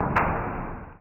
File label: medplat, plats